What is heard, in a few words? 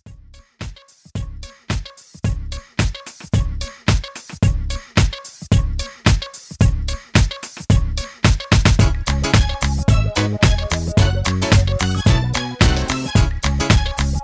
Intro 110Bpm